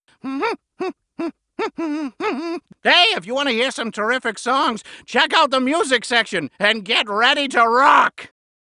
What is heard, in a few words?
Fizzy Music